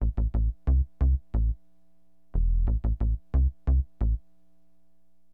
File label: BASS, HipHop; LiveAct Pool